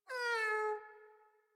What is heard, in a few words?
Kitten Meow Playful; Enemies; Warframe; Catbrow